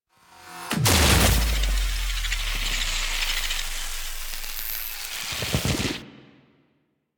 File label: SFX Interference Part B; Warframe; The War Within